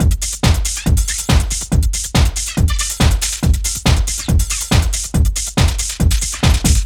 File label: DRM, LOOPS 140, House; Best Service ProSamples vol.10 - House; AKAI